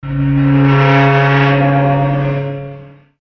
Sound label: metal scrapes